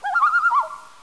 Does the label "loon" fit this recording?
Yes